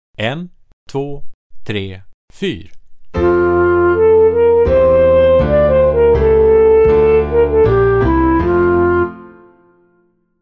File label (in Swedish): Rytm; Övningsprov